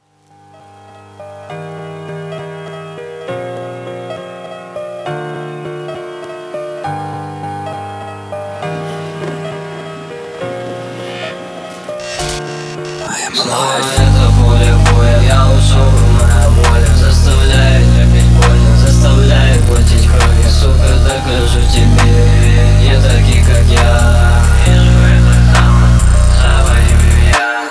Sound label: sped up; russian